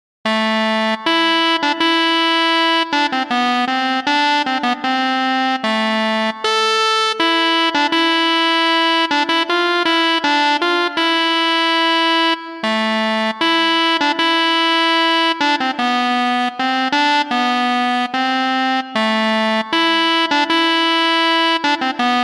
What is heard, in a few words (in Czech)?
Krumhorna